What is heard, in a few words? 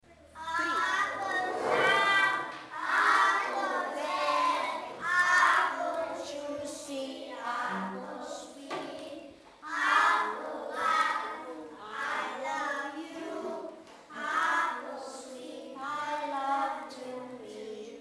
APPLE SONG